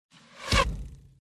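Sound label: zvuk strely, arrow sound; The Witcher Hunt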